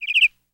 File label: Canary Call, Chirp